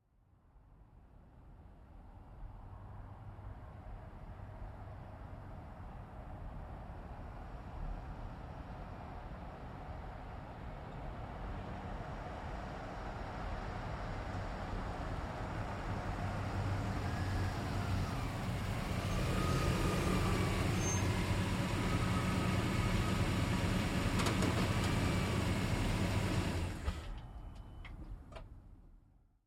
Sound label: GMC Savana, Up, Stop, Slow, Truck; Trucks; Vehicles; 2010 GMC Savana; BigRoomSound